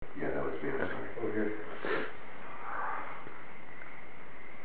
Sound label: church hi